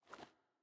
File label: Foley Gear